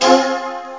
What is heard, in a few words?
wally min stab; The Epic Collection 3; Epic Marketing; muzak samples